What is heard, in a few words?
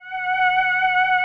ATMO PADS; Best Service; XX Large